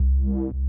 bass swell; 'Effection' samples; lolicore

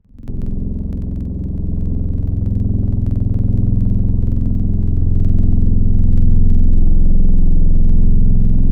Cello; Synthetic's Serum Collection; Echo Sound Works Core Tables; ESW Real

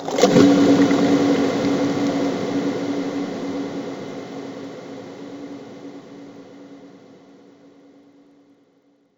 Closed Hat Basically; Synthetic's Serum Collection; Aubit - Gray Vol. 1 Serum Tables

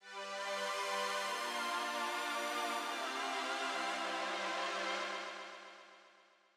pretty pad synth line full; 'Effection' samples; lolicore

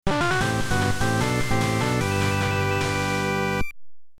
Got Item! (Internal Percussion); studio pixel

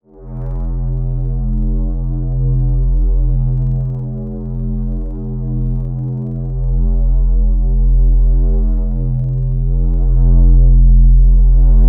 Synth Pan Flute; Synthetic's Serum Collection; Echo Sound Works Sphere

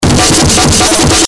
chopper; lolicore

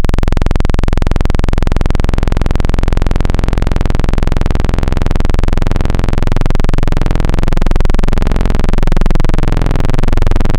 Saw; Synthetic's Serum Collection

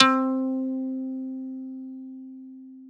Guitar C3; Synthetic's Serum Collection; Aubit; Awake Vol. 1 Serum Noises

